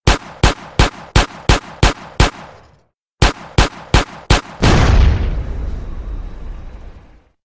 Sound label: pistol explosion; san andreas